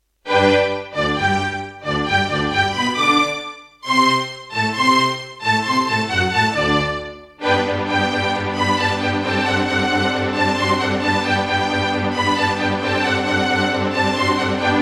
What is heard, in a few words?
Serdaco MP32L GeneralUser GS Bank; Mozart - Eine Kleine Nachtmusik partial; classical